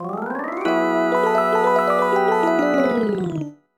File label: Goal Reached! (Minigame Win); mario vs. donkey kong 2 march of the minis; mario vs. donkey kong 2 minimini daikoushin; nintendo ds